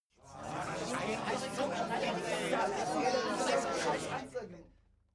male crowd grumbling short

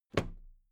toolbox close drop lid soft

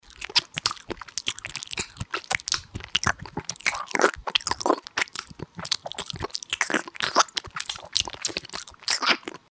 smack seq

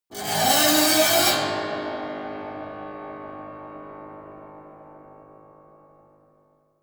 piano bow string broken

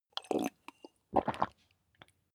tea drink

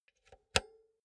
insert cinch hard

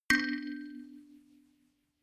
notification kalimba rattle third

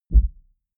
sub bass soft

